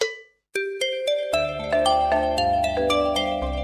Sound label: Oppo A96 Sms Ringtone